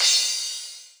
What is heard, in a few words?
Akai XR-20, Drum Machines, Cymbals